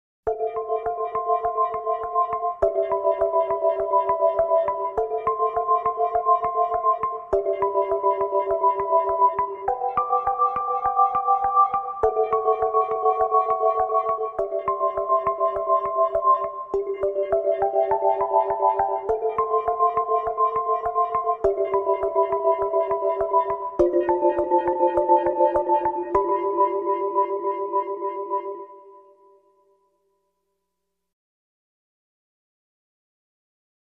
Rhythm

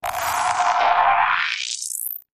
Thin Hollow Grit Sweep

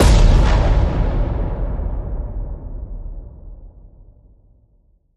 Stars Beyond Blaster Hit

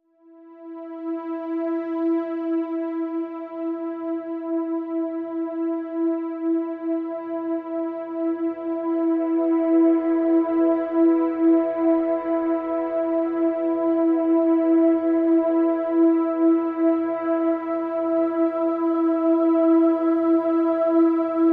Pads Vapors (E)

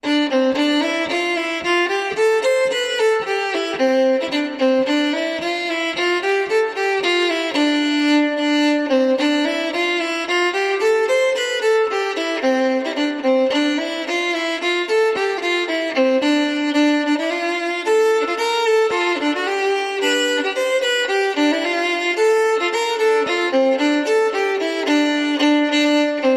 Fiddle

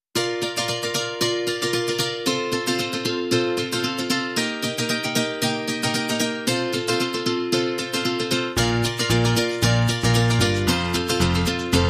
Happy Days, No Drums

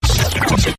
Quick Garble, Taylor Grand

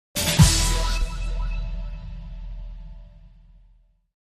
Correct Answer

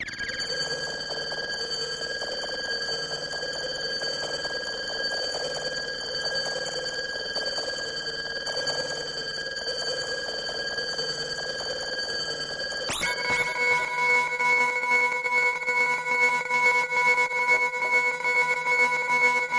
An Alarming Change Musical Pulsers

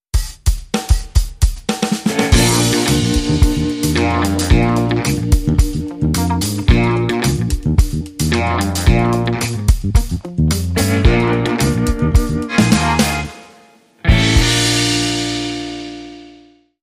Living the Funk

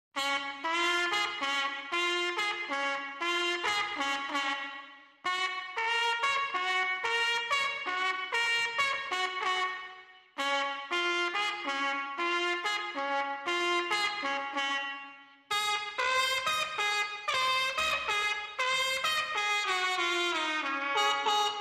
Trumpets

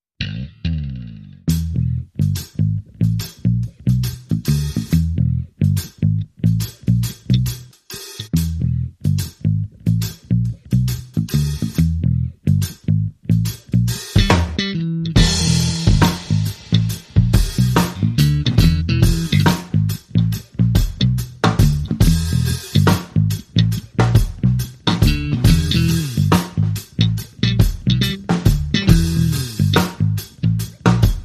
Groove, Drums and Bass Only

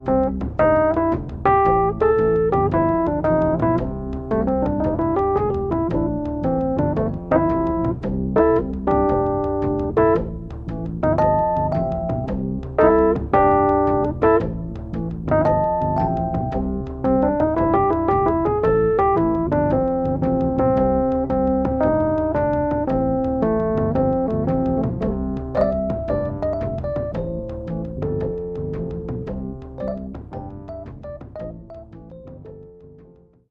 Tribal Lands

